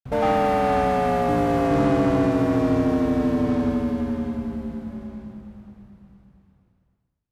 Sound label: classic shutdown, computer sounds